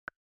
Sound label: movement sounds hover